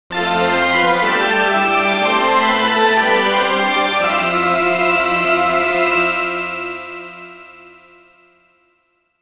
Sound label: Phantom Organ